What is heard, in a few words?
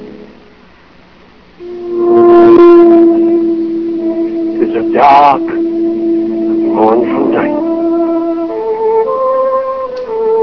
darkmorn